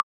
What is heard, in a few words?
Click